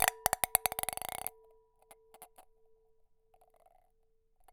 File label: Ping Pong Rattle, Large Wine Glass